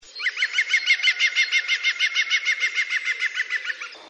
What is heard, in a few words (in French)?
pic-vert, Oiseaux; Sons Oiseaux